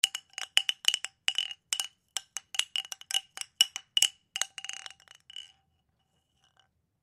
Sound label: Wind Chimes Wood, Foley Wind Chimes Wood Hit; Multimedia; Blastwave